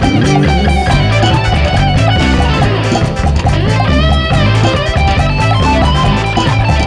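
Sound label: rhythm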